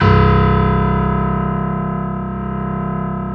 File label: yamaha grand piano